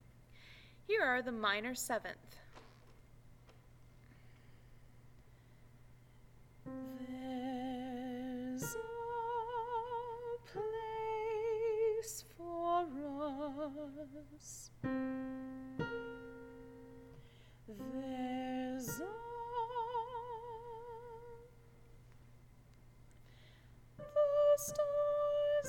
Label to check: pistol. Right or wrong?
Wrong